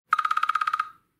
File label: iPhone 12 Hillside Ringtone ios 14